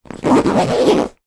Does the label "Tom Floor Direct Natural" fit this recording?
No